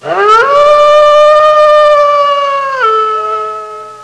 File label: wolf howling; Sound Effects for Multimedia - Volume 1